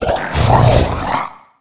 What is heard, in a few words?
Humanoid Snort, Monster Sounds; Monster Sounds Volume II